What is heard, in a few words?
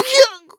frozen vocal; Scrat